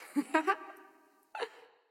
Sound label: giggle; wingsofriches